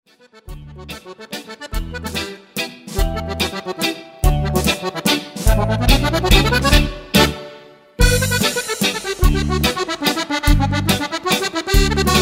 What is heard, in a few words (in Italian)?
mazurchissima